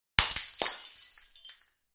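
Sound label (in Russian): стекло разбился стеклянный предмет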